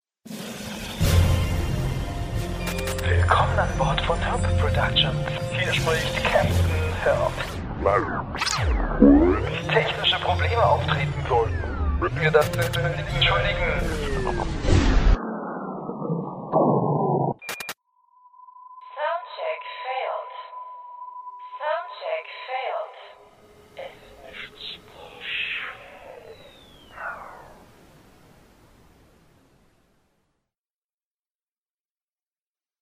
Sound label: Soundcheck